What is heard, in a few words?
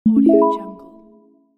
alert